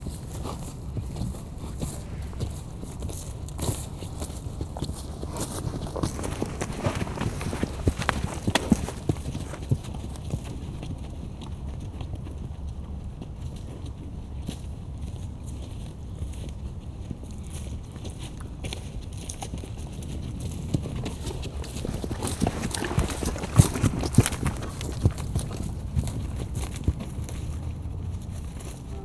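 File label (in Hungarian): kantarszar onodva visszavezetik, lo; parasztudvar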